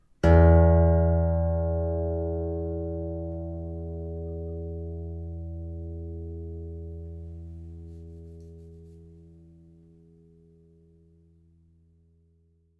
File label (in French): Longueur 65 cm Masse lineique 0 11 g Tension 110 N, Etude d une corde vibrante; Son et musique